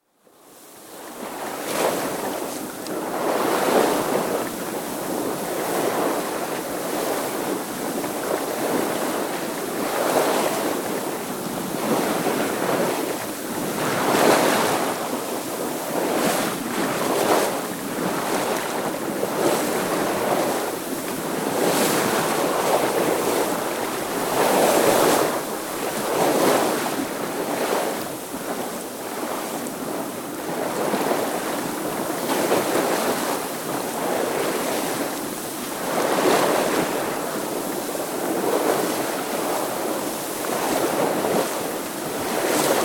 Yacht, bow-wave with lee side foaming, close hauled sail, wind force 4 (30ft sailing yacht, GRP, sloop rig); 'Alice May', Halmatic 30; Boat; BBC